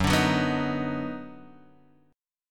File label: D sharp Minor Major 7th Sharp 5th F sharp